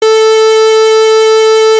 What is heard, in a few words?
Subsynth wave2pwm